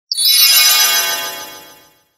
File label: teleport